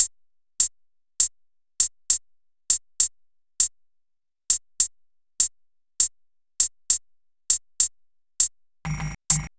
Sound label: Hats Groove; Sonic Foundry (Sony Creative Software); Loop Noir; Session 12 - Otherworld Journey